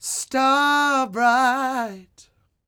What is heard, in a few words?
CROON, Vocal Blues & Soul MELLOW